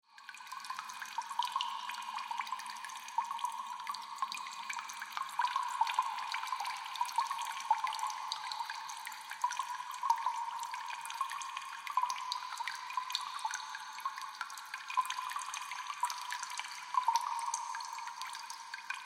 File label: Ambience Sewer Water Drips; Water (Sound Effects:Nature)